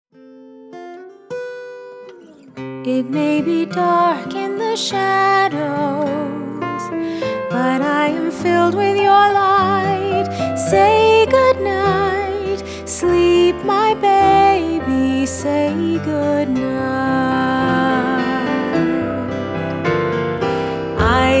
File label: Lullaby